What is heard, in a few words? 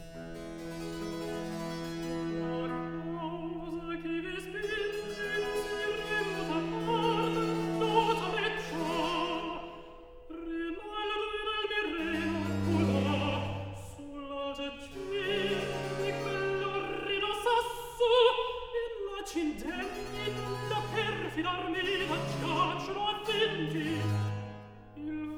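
Recitativo, Handel opera; Bejun Mehta; Act 3; La causa che vi spinge; Handel - Rinaldo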